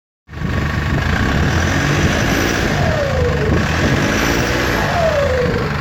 Turbo Diesel

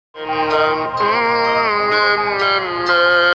Humming

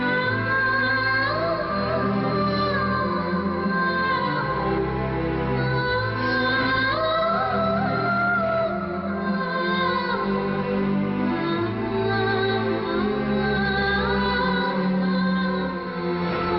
Silent Music

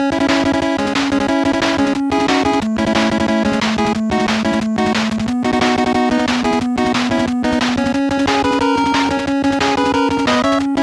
Loop; Megaman Willy

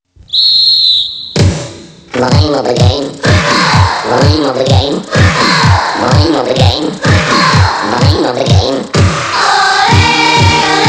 Football Ole

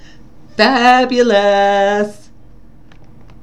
Ring

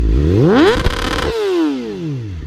Yamaha R6 rev limit

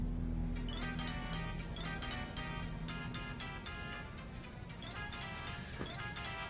Ringtone

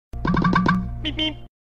Bip Bip; Road Runner

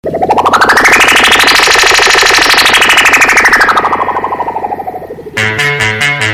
Electric Horror

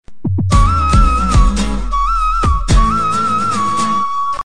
Romantic SMS

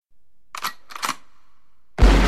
Shotgun

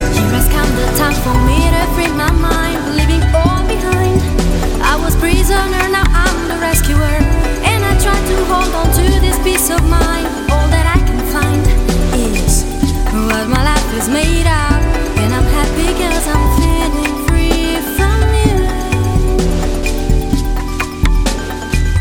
Free Half Tempo191

